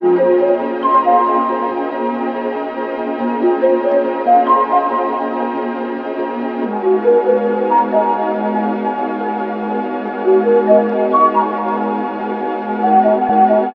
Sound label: keys, jazz loops